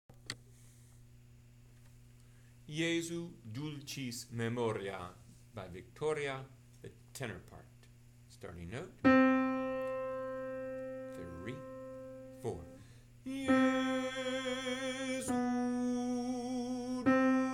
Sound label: Jesu Dulcis Memoria tenor1